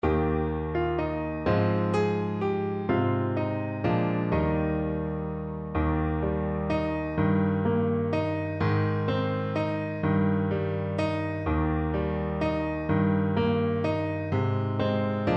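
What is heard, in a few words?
blow the winds, alto saxophone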